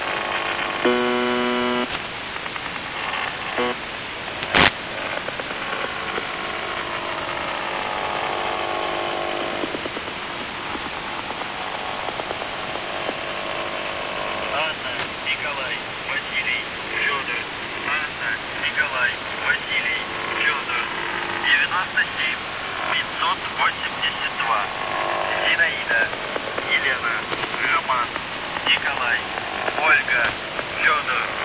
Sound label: buzzer usb msg